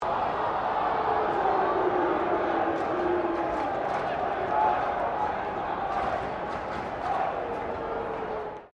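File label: crowd 40K Melbourne Cricket Ground boo low, Cricket at Melbourne Cricket Ground; Crowds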